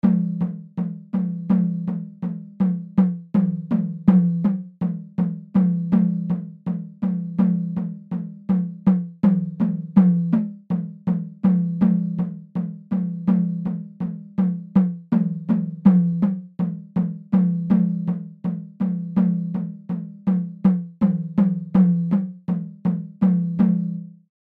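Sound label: indian percussion khol mrdangam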